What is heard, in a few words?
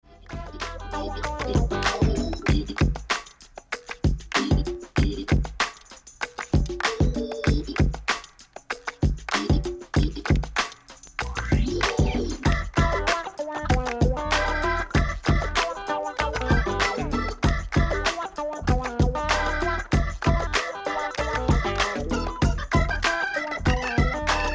Clap Clap (Instr)